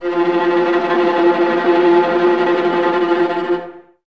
Violas Tremolo, String Ensembles, F; Miroslav Vitous

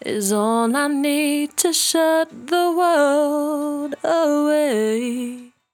68bpm D, Velvet Vocal; Zero-G

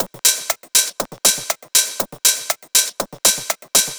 Combo Hat

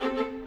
Violas, Orchestral

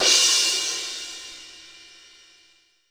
CRSH C2; Crypton - DrumBase5000; R70 KIT #2

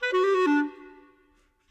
Clarinet; Sonic Foundry (Sony Creative Software); Orchestral VOL-2; Modern 13

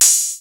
HH hh; Best Service Gigapack 2

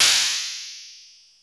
FM SYN PERC; Crypton - DrumBase5000

